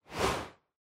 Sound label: Camera Motion Short